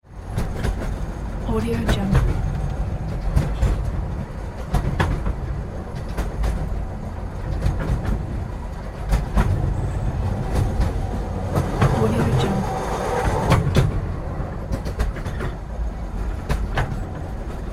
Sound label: slow moving train